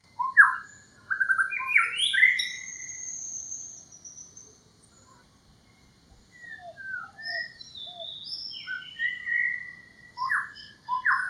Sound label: White-rumped Shama calls combined; Ipoh Perak Malaysia